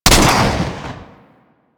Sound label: Swarm Missile Launcher Fire Shot; Warframe